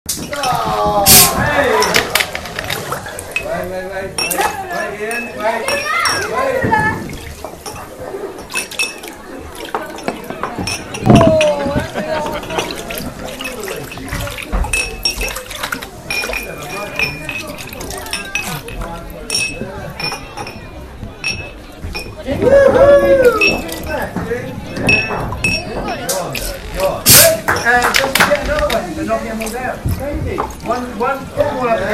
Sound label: background mix